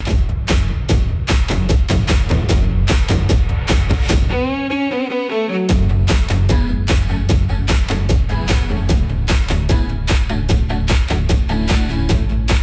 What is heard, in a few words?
loop; opening